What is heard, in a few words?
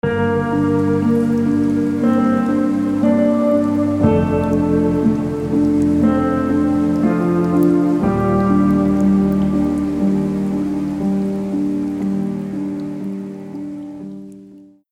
Soft Rain